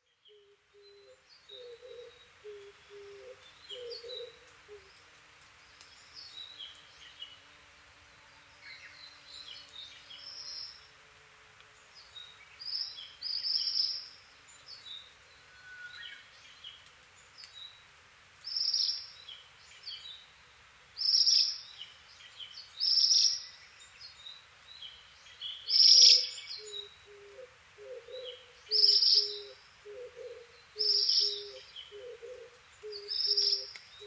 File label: Pericrocotus divaricatus; サンショウクイ; サンショウクイ科; 日光霧降高原 alt=970m; Rec: SONY PCM-D50; Mic: Panasonic WM-61A; 飛びながら鳴く鳥です。; 他の自然音：キジバト、ウグイス、ヒヨドリ